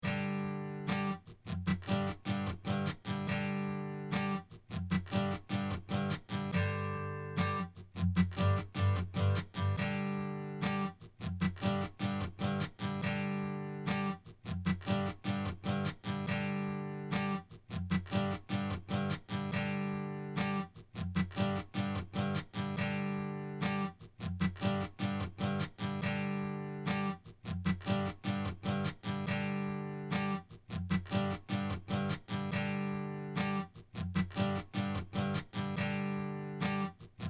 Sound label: G Major Chord Strum, guitar; avoid playing bad notes